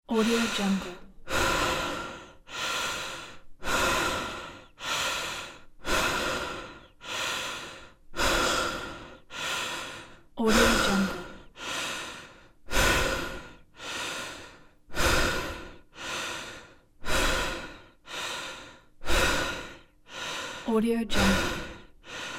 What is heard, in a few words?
breathing slow